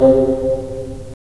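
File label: wind bassoon; LA Composer vol. 3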